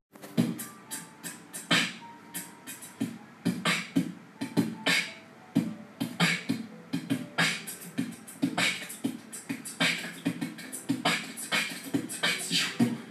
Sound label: Beatbox